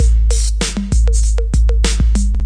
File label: rap with 808 percussion, drum loops and breaks, 097 bpm; 091 to 100 bpm